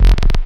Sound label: Synth Bass; Vengeance Samples; VEE Synth Shots